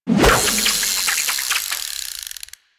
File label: Fishing Spear Line Retract; Warframe; Weapons; Eidolon Fishing Spears